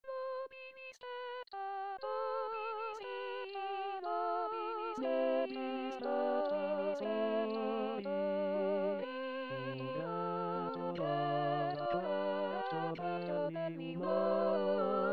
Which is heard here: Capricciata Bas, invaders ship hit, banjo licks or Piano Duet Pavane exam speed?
Capricciata Bas